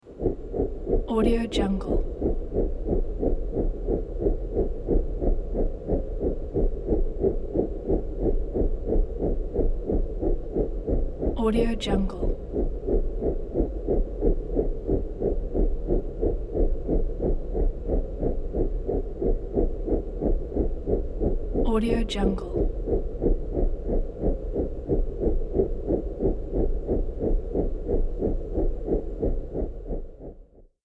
big industrial fan spinning

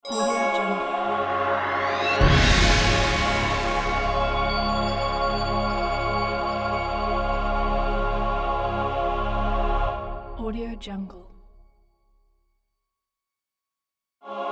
mystic sci fi logo

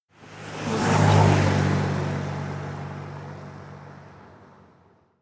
car passing

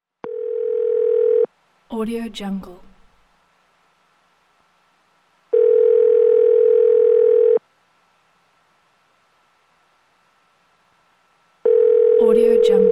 telephony signals

